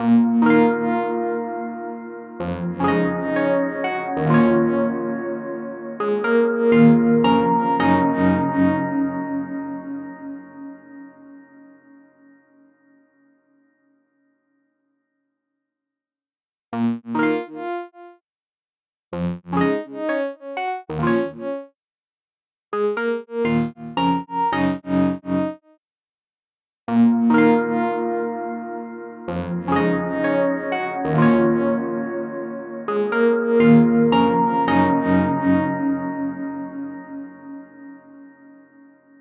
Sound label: Poly Synth Dark Minor Pad; Temperance Pro